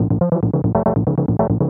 TECHNO SYNTH 140; Keys

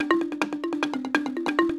XYLO LOOP, ETHNIC STUFF

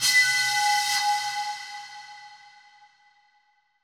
bottle, ethno, 125

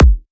kick, drum hits; Anthemic

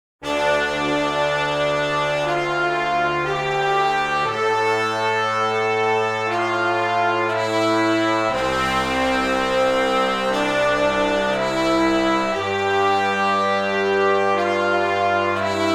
Brass

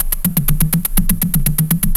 ELEKTRO, 122-146 BPM loop

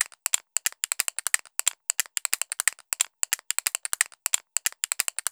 ETHNO EXTRA 90; ethno2

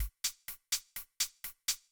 DEEPHOUSE BEATS 125

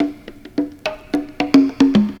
CONGA 108, PERCUS LOOPS

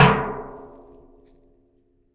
hit, rust kit; Nucleus Soundlab; Ambient Drums

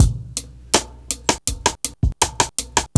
RIMLOOP 98, loop; 0- 99 BPM

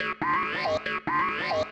TECHNO EXTRA 140

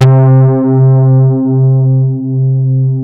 FAT BASS C4, OBMX BASSES

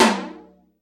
FLANGE ROTO, drum, tom

